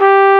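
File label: SOFT FLUGEL G3; AKAI S-Series CD-ROM Sound Library VOL-2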